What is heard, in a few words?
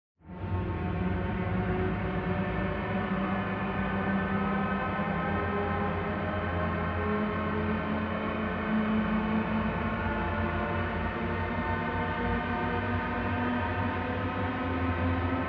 Swarm